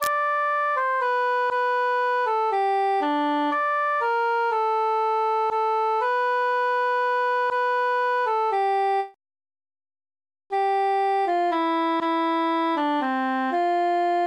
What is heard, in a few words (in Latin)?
altus solo; follow me sweet love